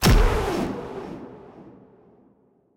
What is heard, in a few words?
defeat scratch; hades; smite